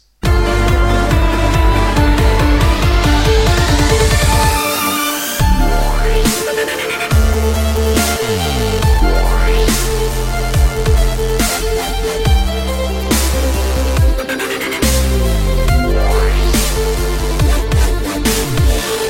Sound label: dubstep violin